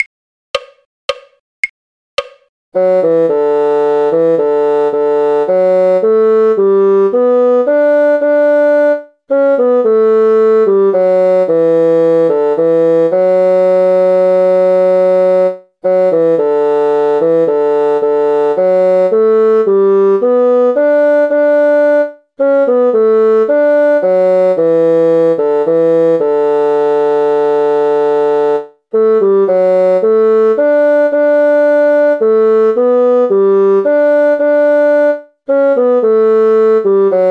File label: bassoon; believe me if all those endearing young charms; folk